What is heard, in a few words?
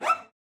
Cello Psycho Screech; SoundsCrate